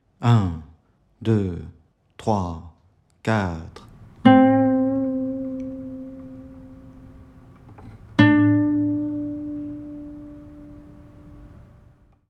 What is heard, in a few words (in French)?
exercice vibrato rock 060bpm